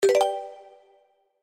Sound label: BTN Max Bet; slots; Pirate Quest